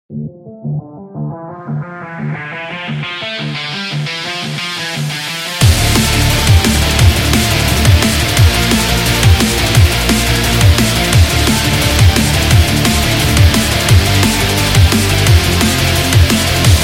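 Sport Extreme, Football percussion background music inspired by samba rhythm, stadium energy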